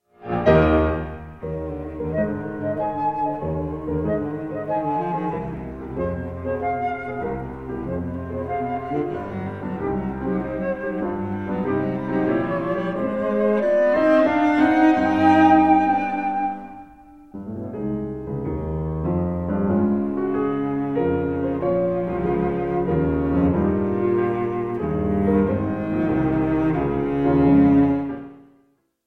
trio in e minor op 45 for flute violin cello piano